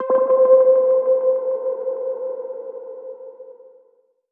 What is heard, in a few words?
KEYS (C); One Shots; Ghost Water; Cymatics; Mystery Pack Vol 4